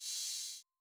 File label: Open Hat